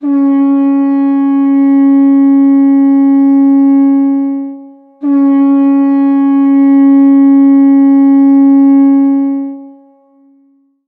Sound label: War Horn; gamerip; Heroine Anthem Zero 2 Scars of Memories